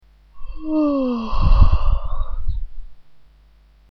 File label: ahhhh